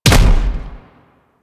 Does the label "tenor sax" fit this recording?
No